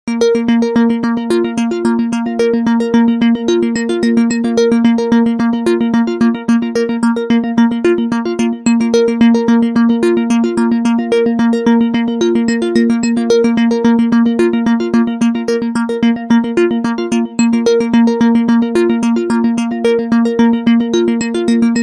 Ringer

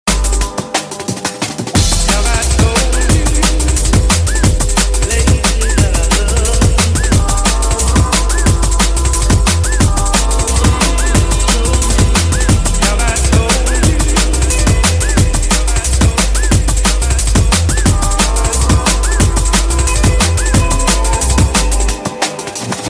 Drumbass

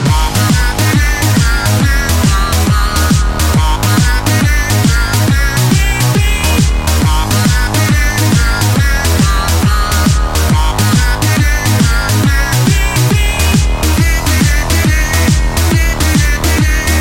Spooktune

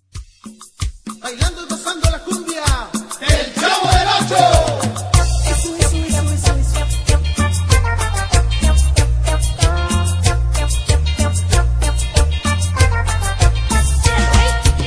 cumbia, ringtones; chavo del 8

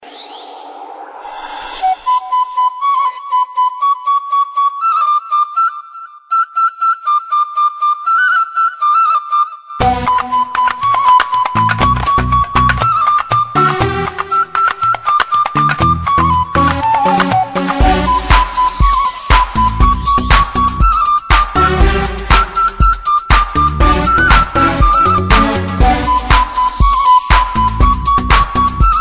amazing flute; country

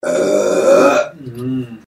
burp

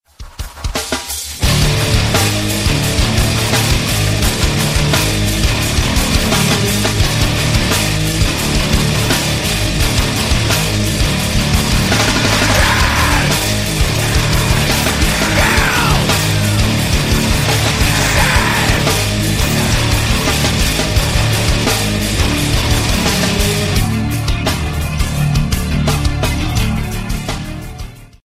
Metal

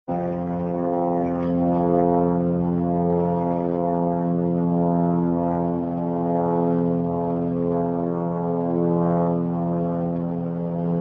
airplane, hip hop ringtone

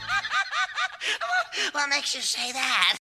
Laugh; Ickis

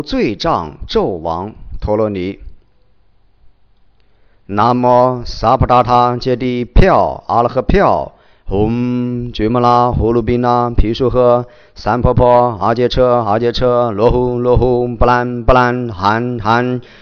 chants; latino

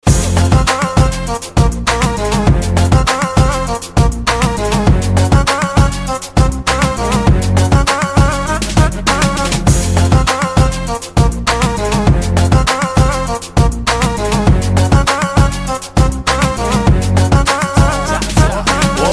Bass

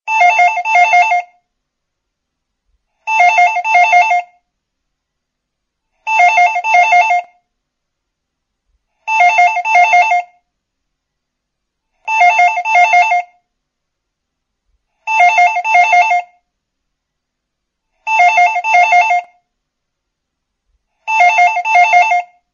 special old phone

